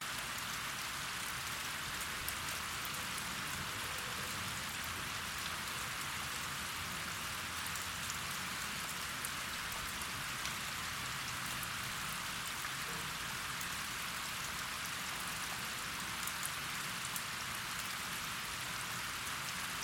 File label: Pitzer courtyard